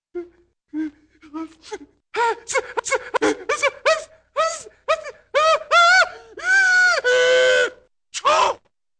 sneezes

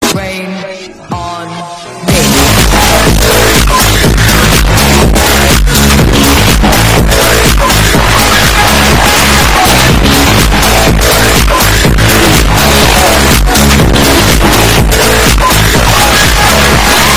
earrape

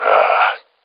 ahhh

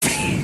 katana; dane cook

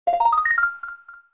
goldskulltula token; oot

